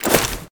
cloth, weapons; garrysmod

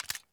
m67 safety; explosive; garrysmod

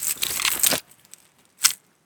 m60 chain; garrysmod

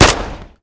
mp9 unsil; garrysmod

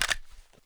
g3 mag out; g3a3; garrysmod

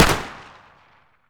mp5 fire; garrysmod

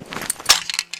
deagle reload start, weapons; garrysmod; deagles

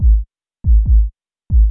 beat; garrysmod

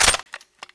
sr25 clip out; weapons; garrysmod

